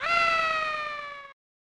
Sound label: scream; SNES